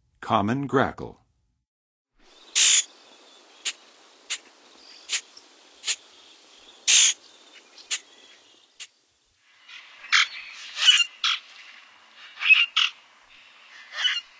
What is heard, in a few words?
Common Grackle, Songs of Birds of North America; Western USA; Western USA Songbirds